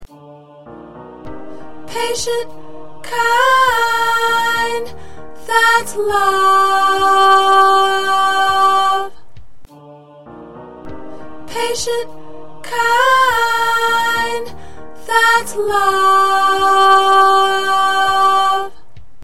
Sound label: 1st Soprano